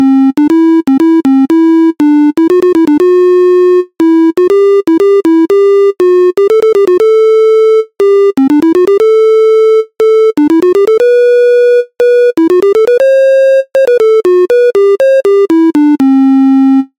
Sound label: diatonic doremi